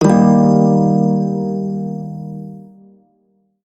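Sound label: Shawzin Minor Chord; Warframe; Prime Shawzin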